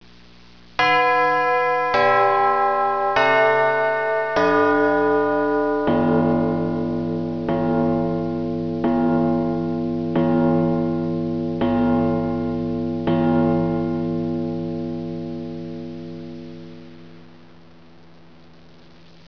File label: clock bells